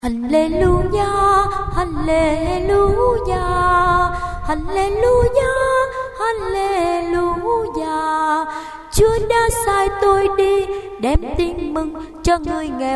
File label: sop alto